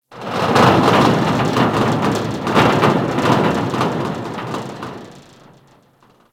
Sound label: Ship Rattle; Warframe; Corpus Ship Remaster